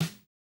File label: snare, snare house